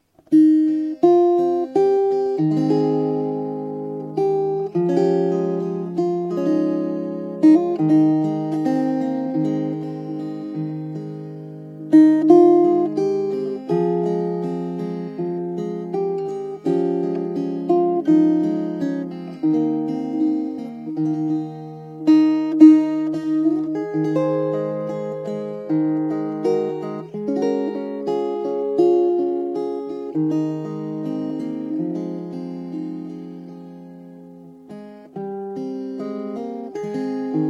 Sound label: banjola appalachian; GoldTone